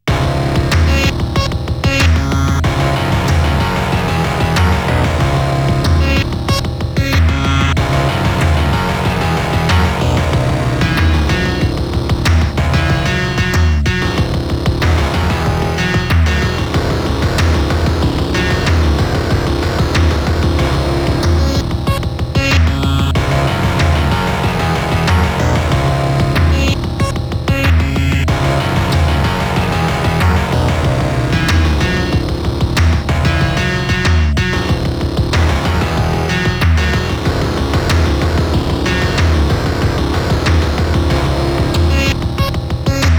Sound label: piano groove